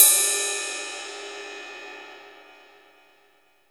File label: CYM RIDE, DRUMS; USB Soundscan vol.02; Underground Hip Hop; AKAI